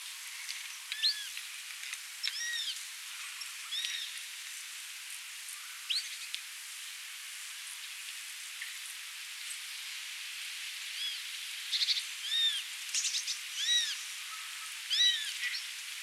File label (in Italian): Sparviere